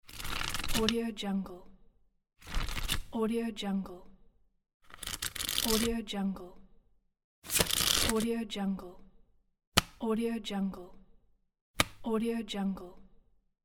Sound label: wad of cash money; domestic sounds